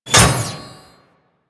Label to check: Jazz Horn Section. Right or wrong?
Wrong